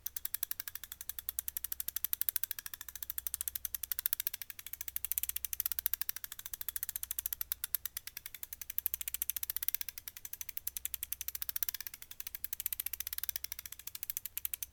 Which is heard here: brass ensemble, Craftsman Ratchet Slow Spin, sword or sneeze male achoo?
Craftsman Ratchet Slow Spin